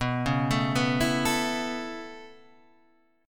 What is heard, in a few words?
B 7th Suspended 2nd Suspended 4th down Guitar Half Step Down, chord